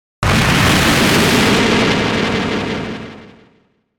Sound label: Blast Static Hit; Production Element Imaging Element Accent Transition; Blastwave